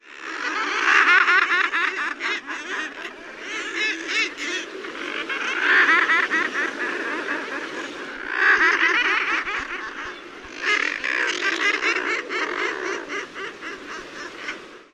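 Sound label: laugh like growls, Thick-billed Murre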